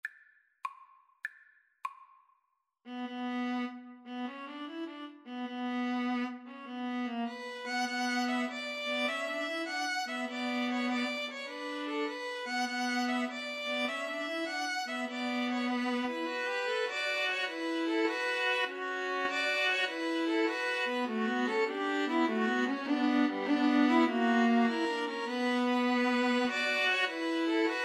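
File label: masters in hall, string quartet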